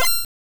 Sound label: Mirror Coat Single Delay; sfx; attack moves; pokemon; gen 2; gsc